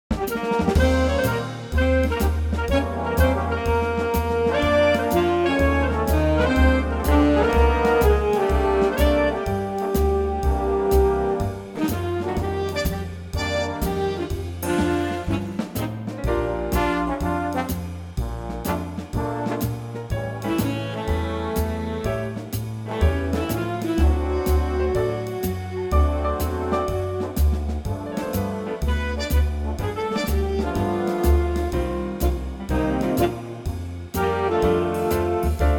Big Band Bb

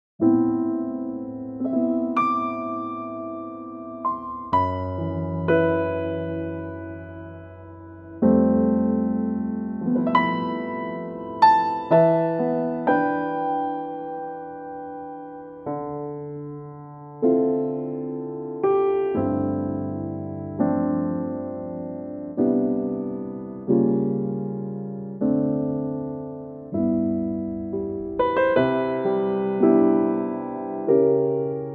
C piano

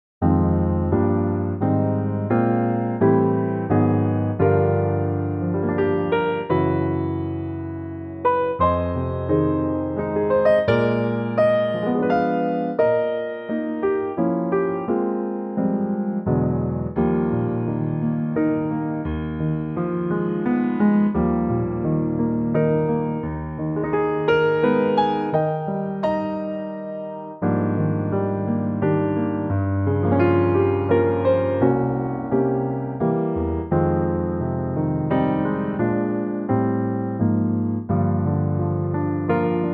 Eb piano

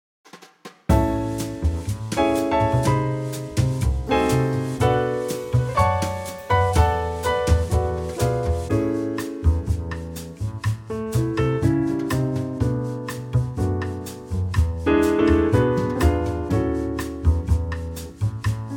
A Trio Bossa

